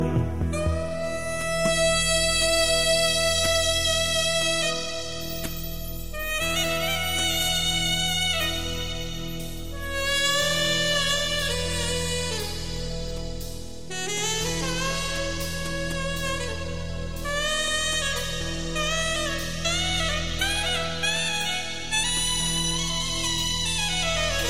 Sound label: Saxo Duet